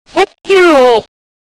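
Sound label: windows vista like startup, computer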